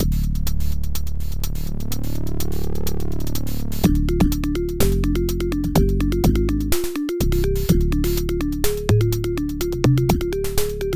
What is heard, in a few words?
The Pit II, Arcade-Alternate Loop